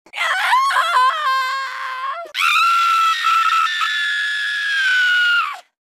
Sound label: extreme boost impaled scream; mk9; mileena